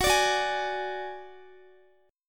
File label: Gb Suspended 2nd Sharp 5th, Guitar; Gbsus2#5 strummed; x,x,18,19,18,16; 4F,4A,5C,5G,5C,6E